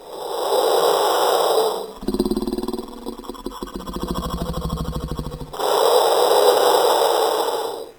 Change Speed

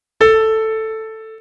Loud Piano

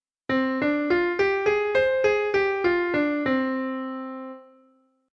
C Minor Pentatonic

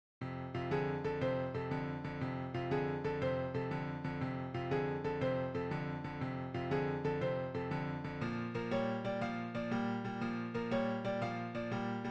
Simple Blues In F; 16Blues

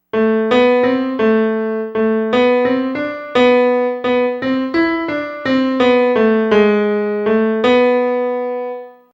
Compound Quadruple

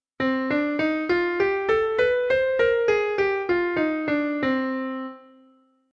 C Melodic Minor

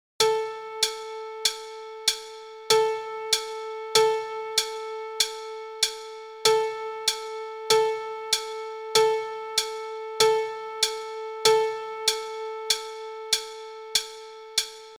Click Track

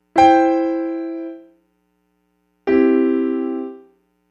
Open Closed

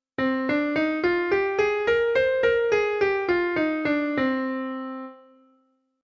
C Natural Minor

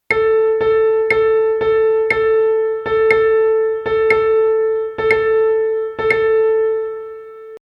dotted rhythms

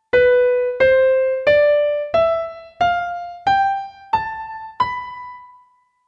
Locrian

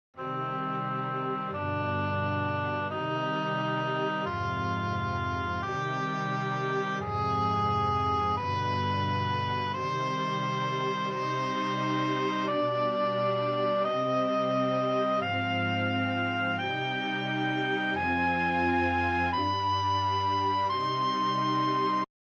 HM Chords

